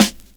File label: SD, snare, 70 s Breakbeats